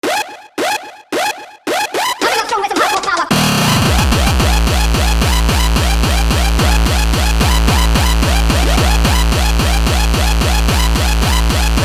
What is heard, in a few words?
hardcore powah